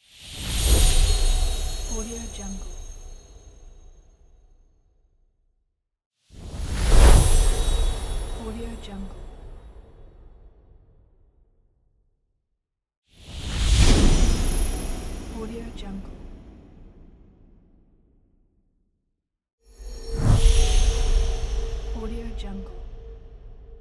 divine whoosh; transitions and movement

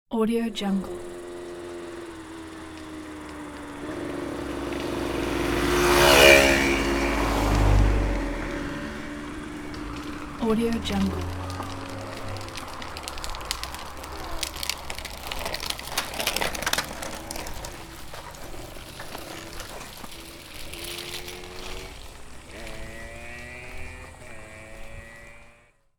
dirt road traffic; urban sounds

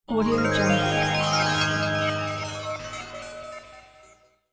oriental fairy spell; game sounds; طلسم فرشته

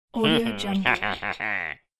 psycho male laugh; خنده مرد روانی; human sounds

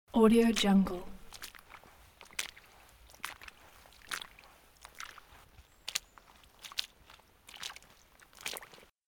walking gently in shallow puddle loop

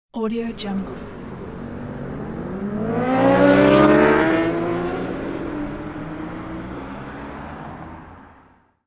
roaring motorbikes fast pass by

